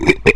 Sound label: gurp